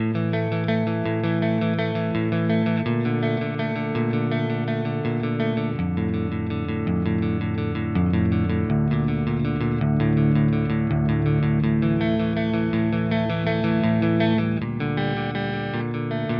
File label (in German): Strat Referenz Clean; Sterling By Music Man Richardson Signature